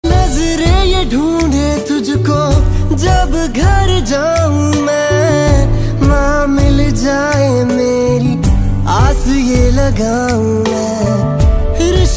ringtone

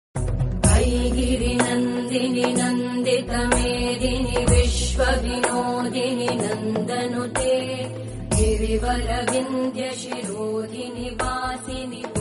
bhakti ringtone

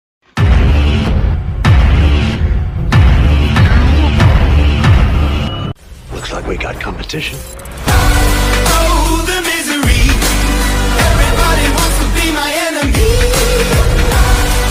BGM ringtone

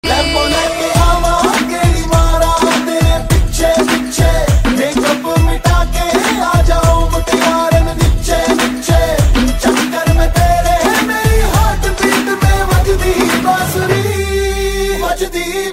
Bansuri ringtone, hindi ringtone

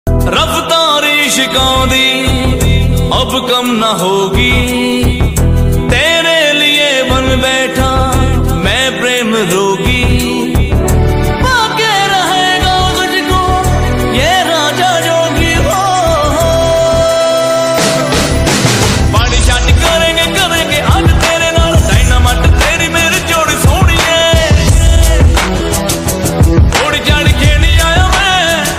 ringtone, hindi ringtone

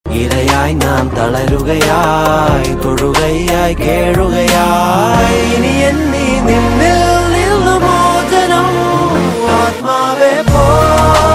tamil ringtone

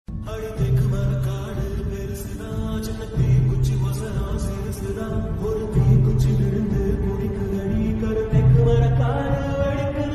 tamil ringtone

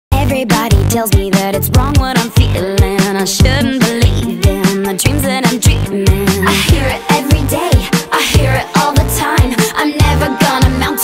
ringtone

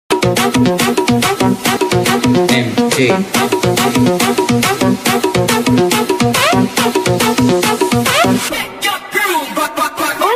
dj hindi remix ringtone

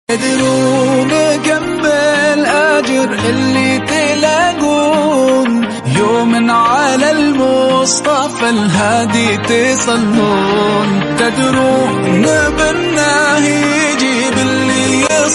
arabic ringtone

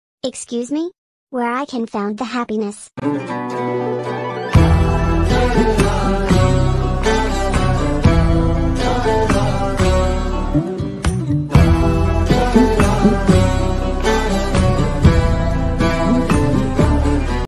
BGM korean ringtone

